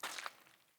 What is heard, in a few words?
Boot Walk Water, Footsteps; Characters; Enemy; Boots; Sinecure; Melee Grunt